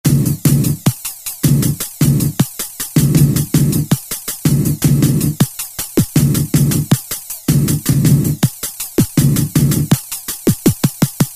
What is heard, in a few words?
Velo Gated, Korg T3